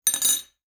coffee spoon; drinks

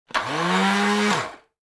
food processor

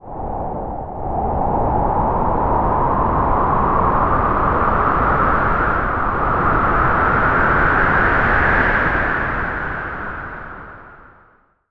wind howl; nature

